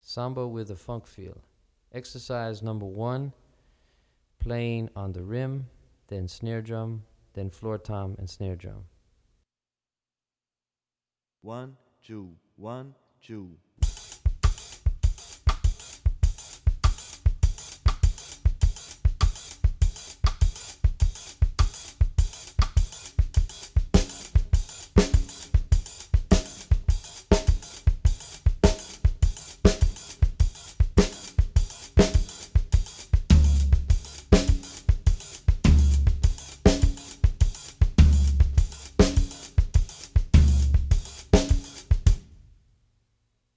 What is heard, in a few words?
Samba with Funk Feel, Drumset; Brazilian Rhythm For Drumset; DaFonseca, Weiner